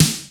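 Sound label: FM snare drum; East West; Global Sounds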